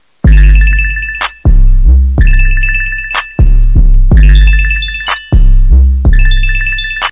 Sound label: drip car